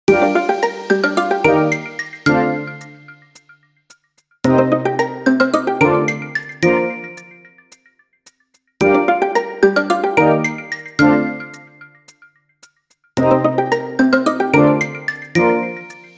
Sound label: cotton candy loop version, classical